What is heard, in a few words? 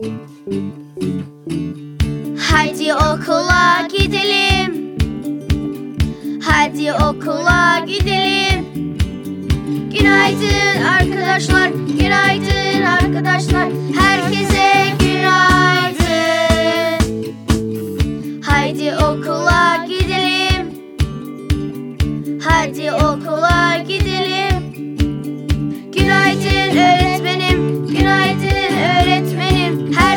song turkish